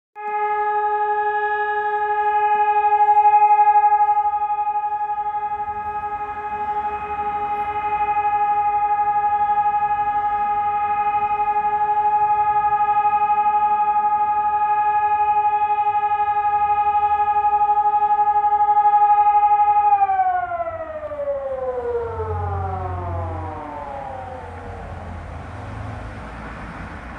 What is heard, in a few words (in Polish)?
syrena